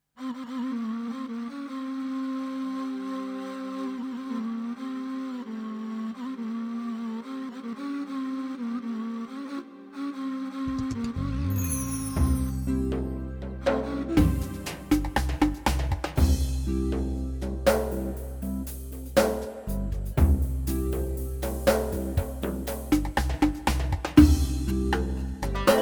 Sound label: Takasiem